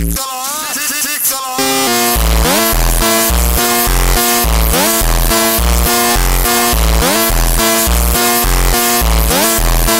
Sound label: phonk